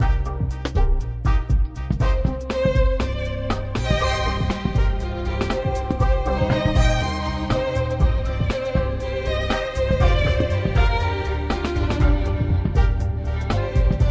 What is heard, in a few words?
gypsy jazz